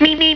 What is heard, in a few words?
beep beep